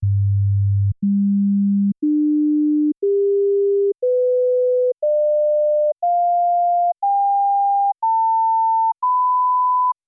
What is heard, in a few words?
sinus tones 48kHz; OmniPower4296